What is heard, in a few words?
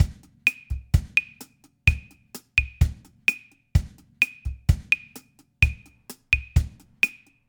clave bossa 2 3